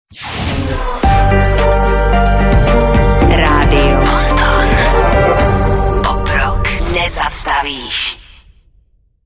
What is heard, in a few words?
jingle